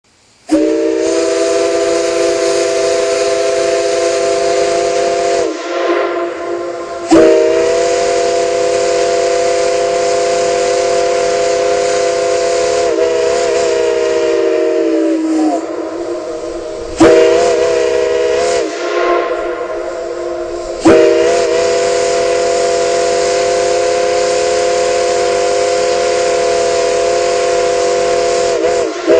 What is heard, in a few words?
horn